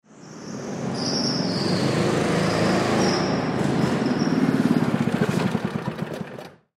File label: Go Kart Pull Up, Go Kart Pull Up Shut Off; From Left; Go-Carts; SoundEffects-Blastwave; Sports